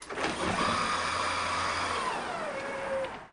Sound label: open